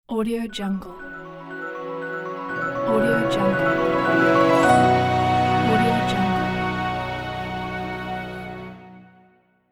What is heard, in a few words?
magical logo intro; johnskoogmusic